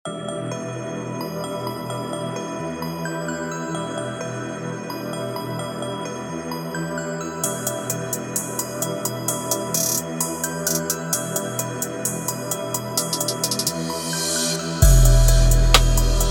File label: trap; traktrain; treasures trap sample pack